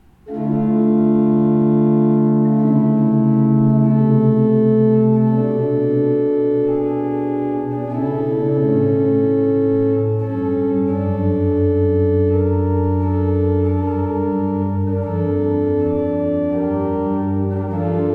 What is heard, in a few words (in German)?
Beckerath, gebrauchtorgel